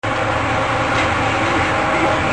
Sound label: Factory, Machines